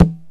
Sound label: CONGA SET; OMI - Universe of Sounds